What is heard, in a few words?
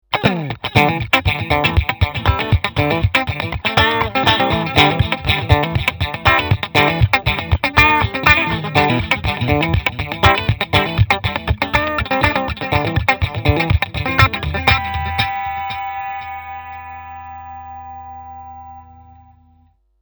Tube Warble